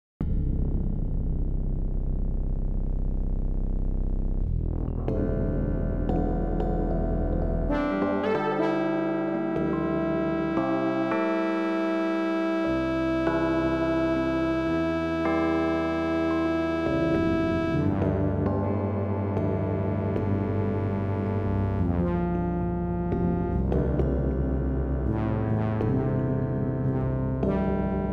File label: Cathedral Bell Partials; Erfurt; fractal music; six eight